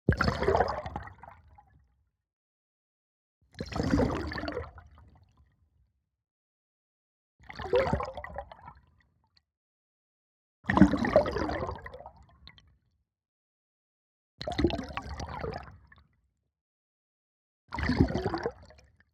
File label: Object Large Passing By Steady Fast Underwater Single